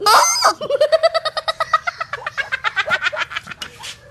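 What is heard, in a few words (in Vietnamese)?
tiếng cười đều giọng trẻ con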